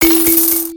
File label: bonus chance; Magic Forest